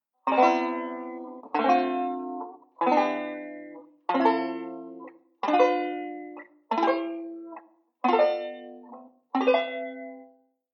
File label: Jazz 7th Chords, banjo; Beyond Chord Melody Volume II